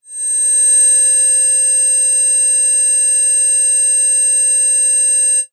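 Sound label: high pitched whine shrill tinnitus; sound design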